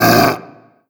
pain, barnacle; Half Life Alyx

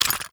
contents, ammo clip rapidfire

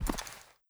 body impact soft; physics

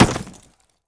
body impact

